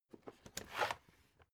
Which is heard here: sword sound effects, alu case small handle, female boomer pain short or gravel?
alu case small handle